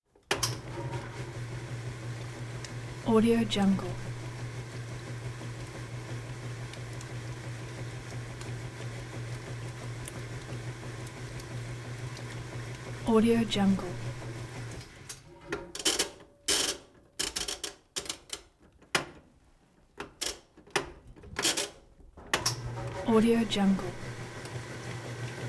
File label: washing machine